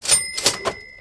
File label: cash; Cube Tower Defense